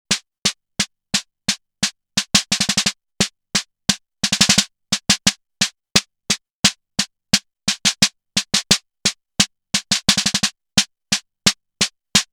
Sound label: Intellijel Plonk Drop Snare